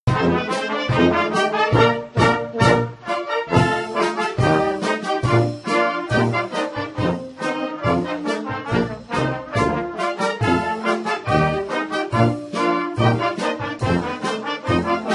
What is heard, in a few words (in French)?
polka